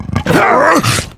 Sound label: death, male zombie; nmrih; zerosiki; slender